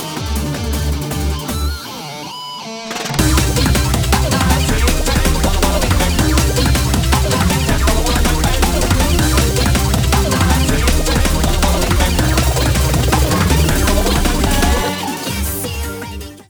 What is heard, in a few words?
160 BPM Alt Plus Rubber